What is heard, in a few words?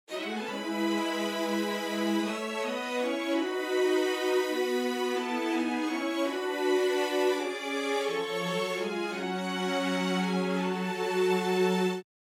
strings dry